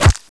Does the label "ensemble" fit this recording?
No